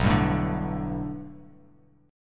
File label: B Minor 7th Sharp 5th B, chord; 7,10,7,7; Bm7#5 strummed; JGuitar